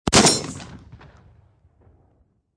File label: Shotgun 12 Gauge Spas-12 Semi Automatic Single Shot Distant Perspective